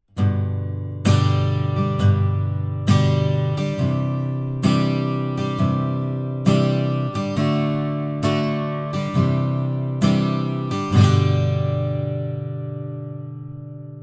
Acc Guitar - R121 Bi Direct - Edge modelled; bidirectional (osmička); edge duo srovnavaci test

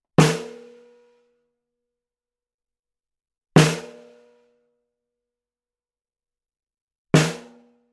Snare - TLM103 Card; Neumann TLM103; cardioid (kardioida); edge duo srovnavaci test

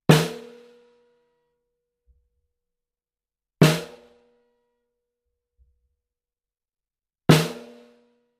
Snare - C414 Card; AKG C414; cardioid (kardioida); edge duo srovnavaci test

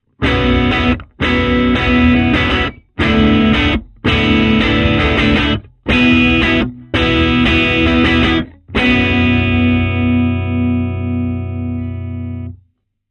Guitar Box - R121 BiDirect - Edge modelled; bidirectional (osmička); edge duo srovnavaci test

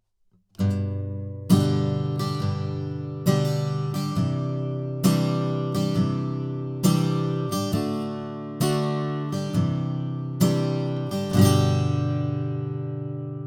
AccGuitar - C12 BiDirect; bidirectional (osmička)

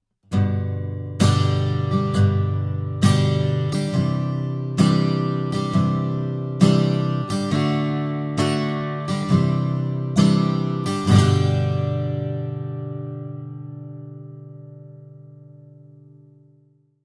AccGuitar - R121 BiDirect; Royer Labs R121; bidirectional (osmička); edge duo srovnavaci test

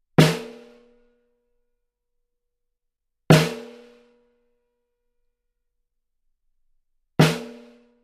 Snare - C12 Omni; edge duo srovnavaci test

